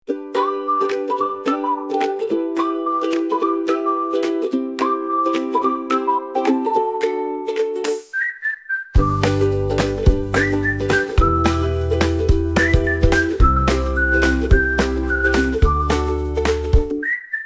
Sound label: comedy background music